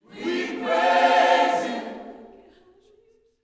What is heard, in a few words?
WE PRAISE, Gospel